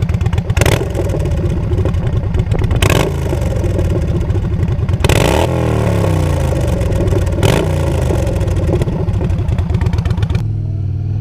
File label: Bassani Radial Sweepers Yamaha Bolt Exhaust Sound; Yamaha Bolt vs Stock Exhaust Sound Comparison